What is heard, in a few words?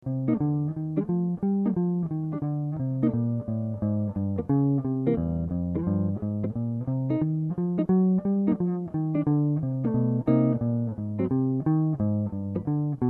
blues